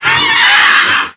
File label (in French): rire